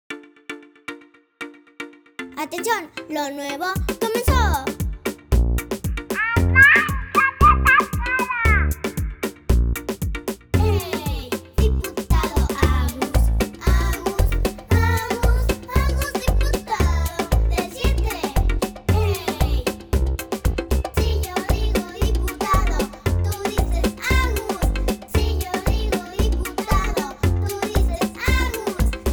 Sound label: jingle